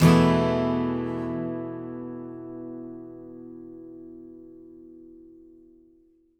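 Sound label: acoustic guitar E sus down, Whole Lotta Country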